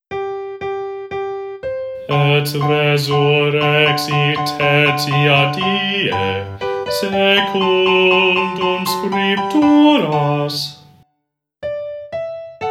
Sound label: Bass